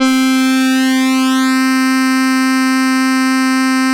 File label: electric str; RC SYM21